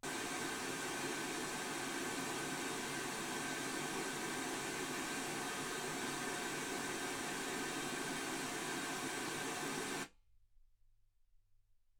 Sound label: wgn 48kHz